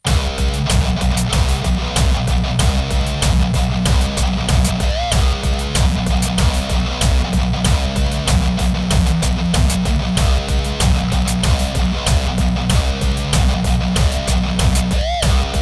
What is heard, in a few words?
lsdj nanoloop guitar; djentlemens agreement; weeklybeats 2026